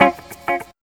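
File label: guitar chord; E-Lab; X Static Goldmine 1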